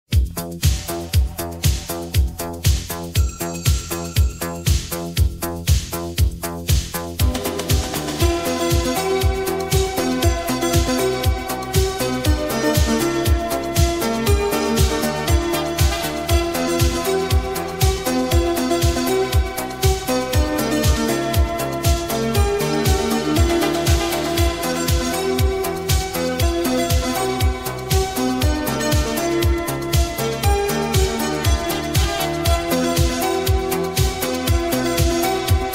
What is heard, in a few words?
ai italo disco